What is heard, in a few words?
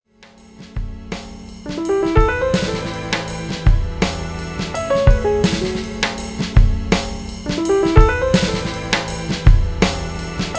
drums